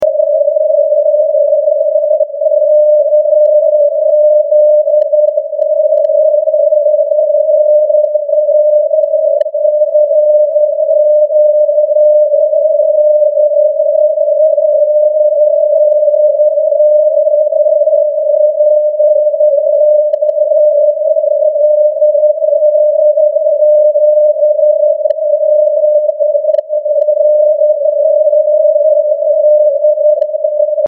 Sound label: cw bw 129hz, 137khz; Perseus